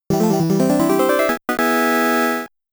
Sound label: Challenging Stage, Start